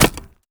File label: archer bow hit; Aragami